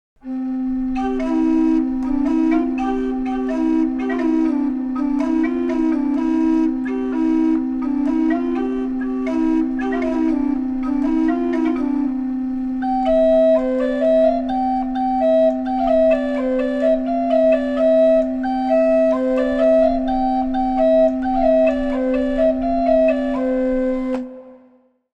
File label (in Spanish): organeto